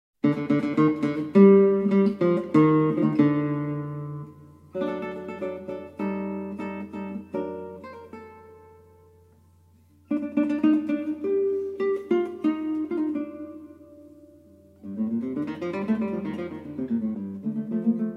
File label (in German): Spanische Gitarre